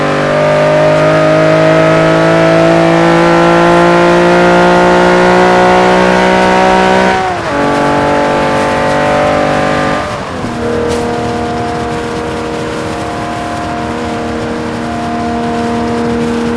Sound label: fourth cruise, vehicles cars; v12vantage; tdmcars